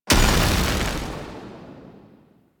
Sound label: Fist Spark Explosion; Enemies; DE; Warframe; Tyl Regor